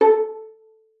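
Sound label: violin pizzicato A5; Xsample VOL-5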